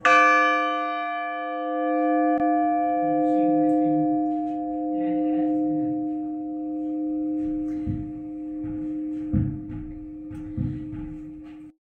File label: bells of sussex; horsham old 4th